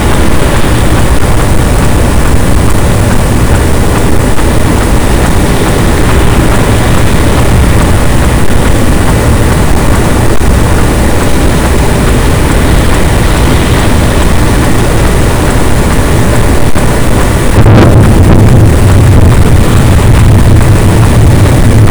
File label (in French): cachalot, boat; Physeter Macrocephalus